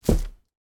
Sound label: moses basket put down